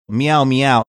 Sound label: meow meow